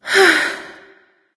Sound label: sigh; smite; aphrodite